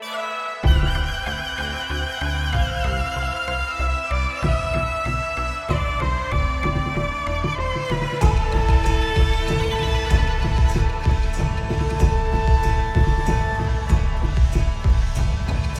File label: Music Tutorial; Sifu